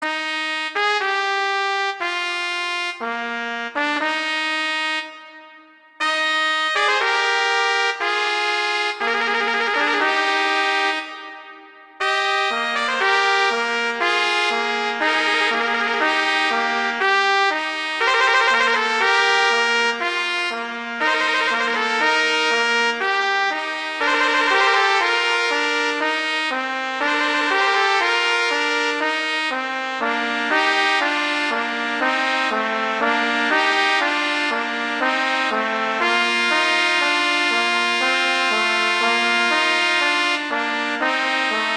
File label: Largo; Telemann; Canonic; Sonata 6